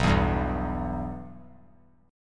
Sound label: B Suspended 4th Sharp 5th B; Bsus4#5 strummed; JGuitar